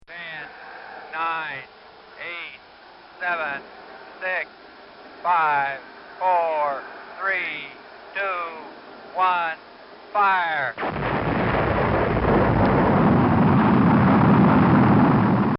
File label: Rocket Countdown And Blastoff